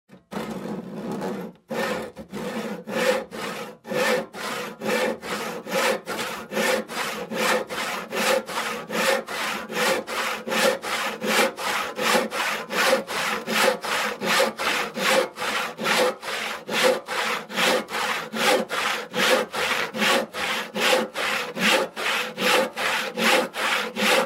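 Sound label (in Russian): zvuk obychnoj pily, ordinary hand saw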